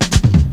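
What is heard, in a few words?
drum roll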